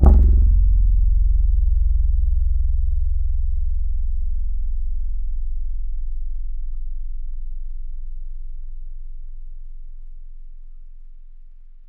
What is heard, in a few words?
Guitar Palm Pluck; Instruments; Synthetic's Serum Collection; Echo Sound Works Core Tables